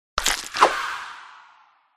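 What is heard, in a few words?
undead attack voice up; umertvie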